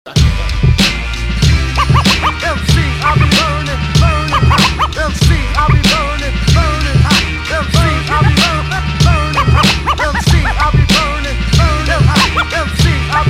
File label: polyrhythm break glass